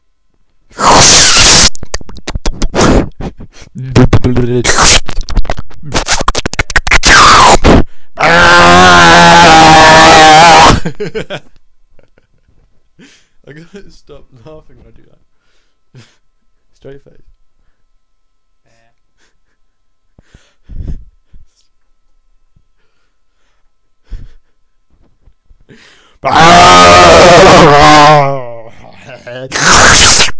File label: VOX